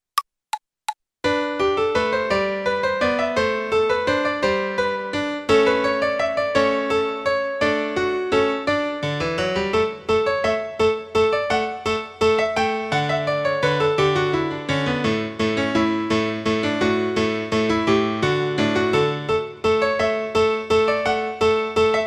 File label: Minuet in D major